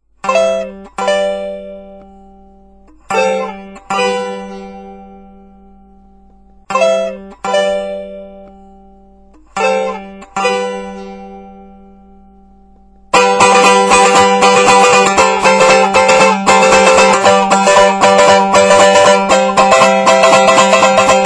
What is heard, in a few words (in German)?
strum gebrochen, strumstick